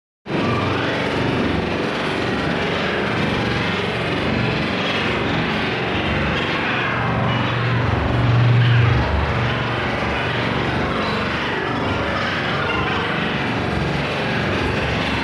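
Large Synth Motor Rapidly Oscillating Rhythmic Low Frequency; Electronic Sci-fi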